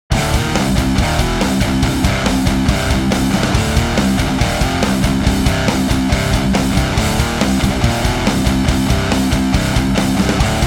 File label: METAL; Product Axiom; Preset HansMetalPack